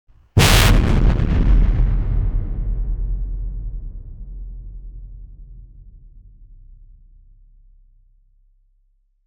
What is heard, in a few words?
explosion with bass boost